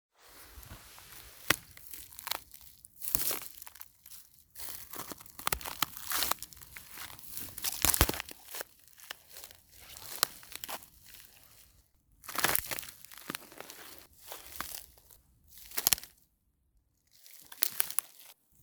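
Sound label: footsteps making way through branches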